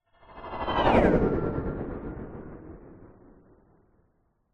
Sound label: science fiction hovering vehicle pass by fast